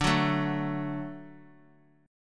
D Major D down

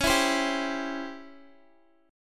Db Minor Major 7th Flat 5th, Guitar; x,16,16,17,19,x; 4F,4A,5C,5G,5C,6E; strummed

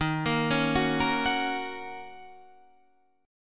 F sharp Major D sharp; arpeggiated; JGuitar

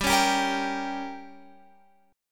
G Diminished, Guitar, Open F; strummed; JGuitar

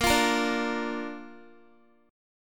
Bb Major, Guitar; strummed; x,13,15,15,14,x; 4E,4A,5D,5G,5C,6F

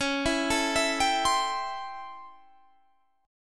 A 7th Sharp 9th; 21,19,19,21,20,20

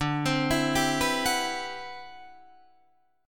D 13th D, down, Guitar, Drop D

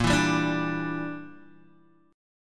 Bb 6th Flat 5th Bb, Guitar Open G

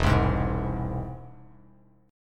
E Augmented, Bass Standard; E+ strummed; JGuitar

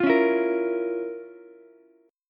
F Major 7th Suspended 4th Sharp 5th down Ukulele; FM7sus4#5 strummed; JGuitar

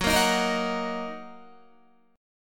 Fsharp 6th down Guitar; F#6; strummed

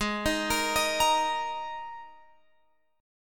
Ab Suspended 2nd chord, Guitar, Open F tuning; Absus2; arpeggiated; chordshape

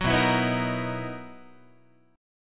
B-Major 11th Guitar, BM11 chord; strummed; JGuitar

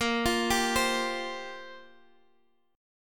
F Suspended 2nd Suspended 4th Bb

